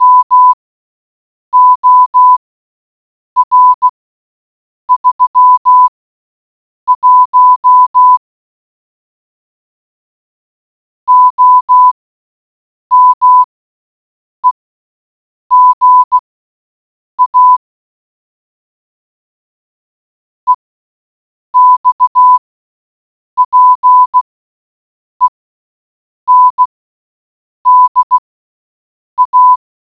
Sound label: morse code; saving isabella